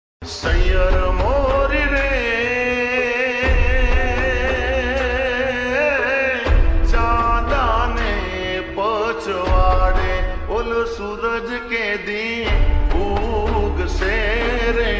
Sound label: slow gujarati ringtone